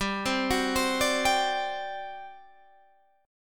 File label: G Major 7th Suspended 4th, G, down, Guitar, Half Step Down